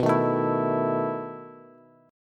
B 11th B down Bass; strummed; JGuitar

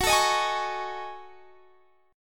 F sharp Minor 6th Add 9th, Guitar Standard; strummed; x,21,19,20,21,21

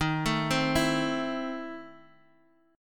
E Major 7th Suspended 4th Sharp 5th D sharp

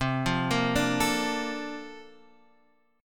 Bb Major 7th, C; Bbmaj7/C; arpeggiated; JGuitar

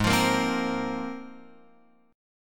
G sharp 6th Add 9th, Guitar, Open F; strummed; x,3,5,5,3,5; JGuitar

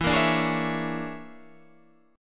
Bb 7th Sharp 9th Flat 5th E

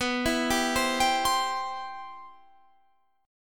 G 6th Add 11th B, chord; G6add11/B arpeggiated

